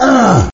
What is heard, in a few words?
pain